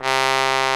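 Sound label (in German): posaune bass C2, trombone; Best Service; Alpine Volksmusik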